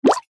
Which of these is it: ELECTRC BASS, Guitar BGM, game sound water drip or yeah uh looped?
game sound water drip